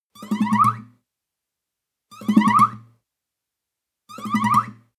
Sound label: string chirp slide up multiple fast